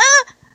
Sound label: Grunt; Lewd SFX